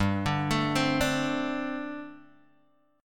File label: G Suspended 2nd Suspended 4th, Guitar Open G